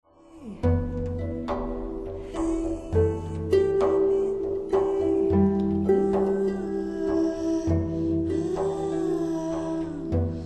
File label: Turkish Lullaby